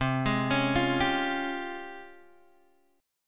Ab Major 13th C

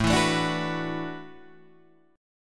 E 7th Suspended 2nd Suspended 4th, A

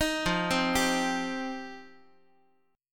F 7th Suspended 2nd F down Guitar; F7sus2; arpeggiated; JGuitar

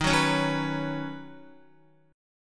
D sharp Minor 7th Sharp 5th, Guitar; strummed; JGuitar

